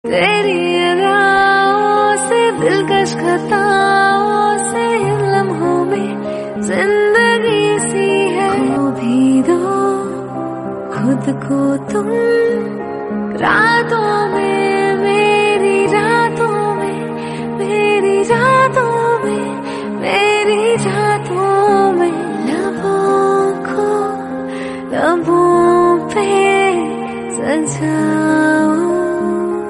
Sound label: Female Version Ringtone